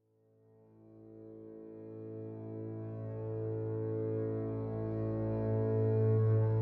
Tension Start 6-4 100bpm 2bar; project zomboid

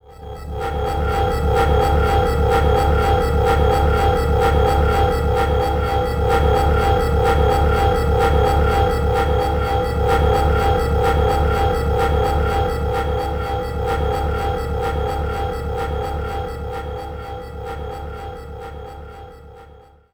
Sci FI Amb

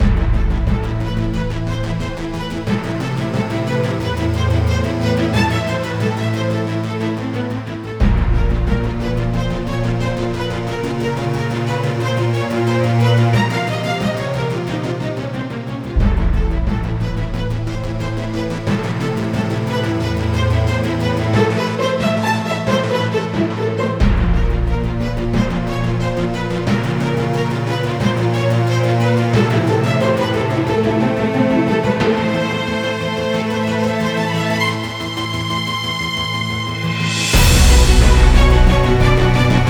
Tension

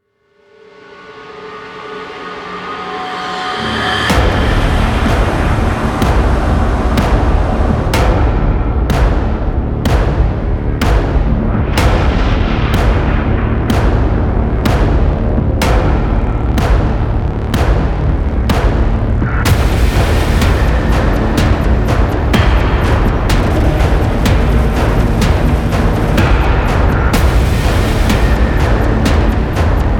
Main Ambient

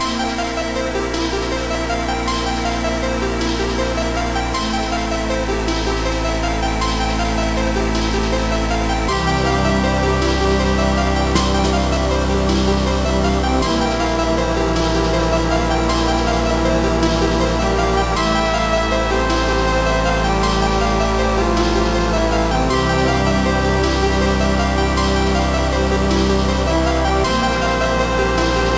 Level 1 Loop; high strangeness; wii u

